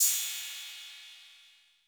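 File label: 808 cymbal, TR-808; Crypton - DrumBase5000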